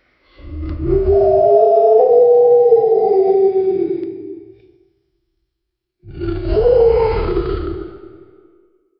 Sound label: dragon vocalization